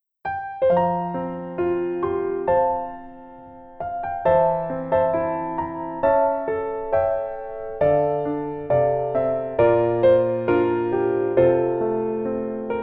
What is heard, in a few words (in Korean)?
Piano ver 멜로디 MR 여자 키